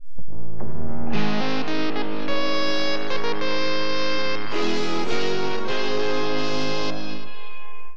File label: jingle; iba 1980s